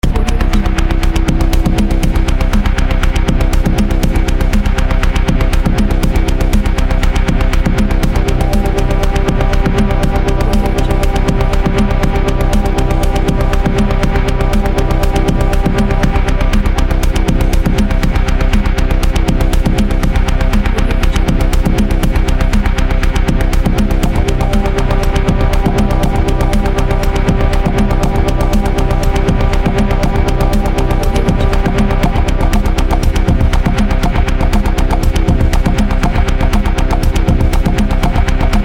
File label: african drums; cenbas